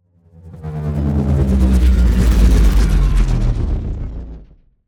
transitions sci fi movement; SoundBits

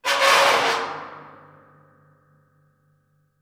Corrugated Iron Sheet; 99Sounds; The Warehouse

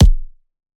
Punching Perc, Straight Glove; 99Sounds; Punching Percussion

